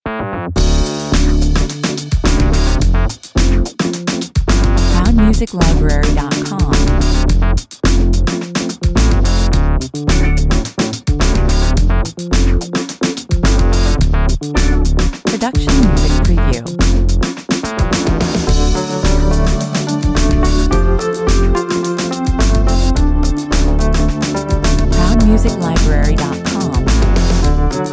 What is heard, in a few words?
funny 108 bpm